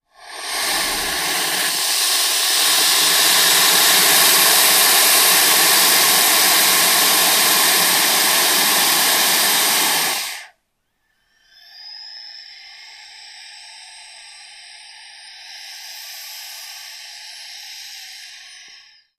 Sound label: Python Burmese Hiss